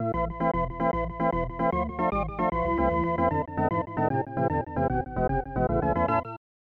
Fanfare ~ Drama; super bases loaded 3; snes

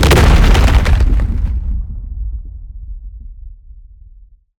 huge rock impact; ice age scrats nutty adventure

